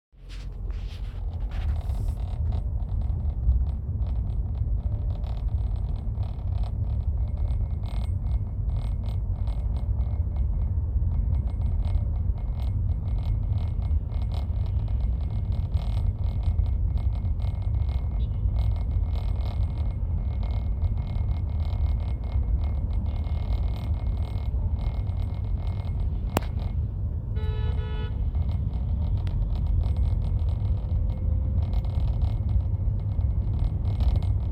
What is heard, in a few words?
rattling noise dashboard rattle, c-class w205